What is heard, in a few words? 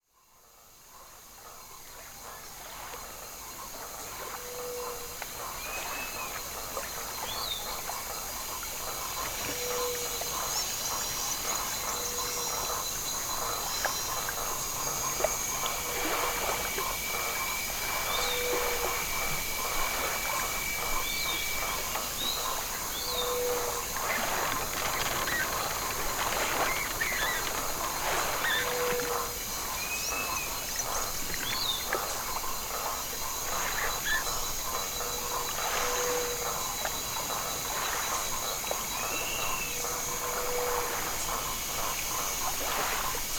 Twisted Strand Ambience Day; dredge original soundtrack 2023